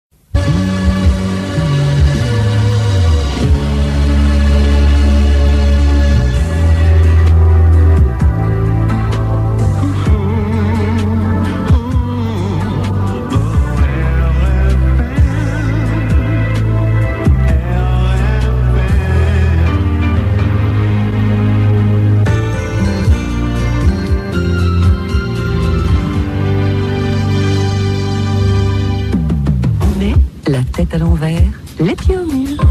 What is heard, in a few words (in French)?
Divers jingles; RFM